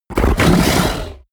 fishman snort aggressive; kreaturen

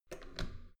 metal door heavy handle down soft

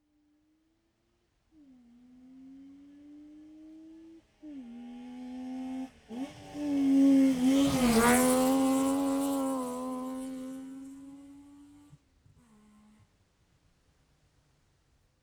car saab 92 exterior pass left to right

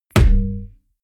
heavy bass saturated hollow, hits impacts

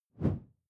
whoosh simple slash big low; swooshes-swishes

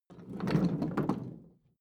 roll smooth; haus garten; wohnen

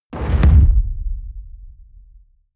heavy sub noise hard; whooshes rises; hits impacts

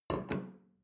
plate place table soft; soundpool

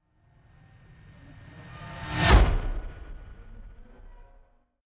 fly by hover car hum high

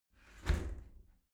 shower slide door close hit soft

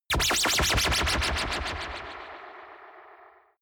laser beam shot; krieg-waffen; soundpool

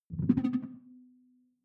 sci-fi alien bounce; swooshes-swishes

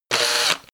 drill classic sequence short; bohrer; soundpool

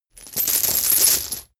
metal chain drop on ground

